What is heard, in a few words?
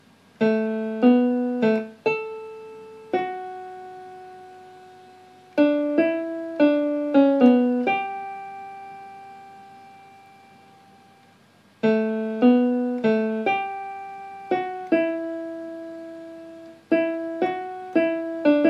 piano; violin